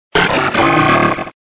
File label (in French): Cri